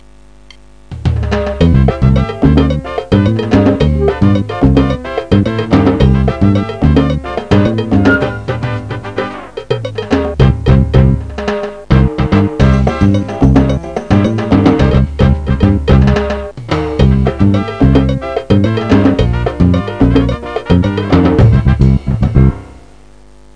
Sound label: mambo